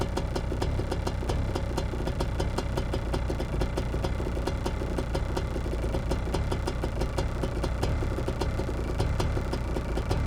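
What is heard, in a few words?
KART interior IDLE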